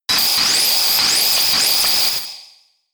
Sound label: Hyper Beam; attack moves; Pokemon; Gen 4; DPPL HG SS